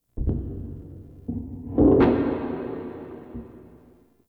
Freaky Taps; Warframe; Shadowgrapher

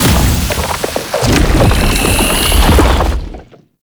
Lash Limbs Charge; Octopede; Warframe; ManInTheWall

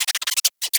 Forest Rodent Vocal Captured Idle; Hunting Critters

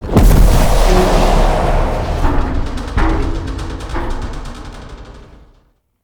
Pull Beam Start; Encounters; Collector

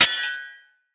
Short Sword Medium Parry Impact; Weapon Impacts; Player; Swords; Combat

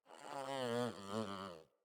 Kitten Yawn; Catbrow

